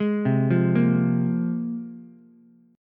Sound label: A sharp 7th Suspended 2nd, Ukulele chord; arpeggiated; JGuitar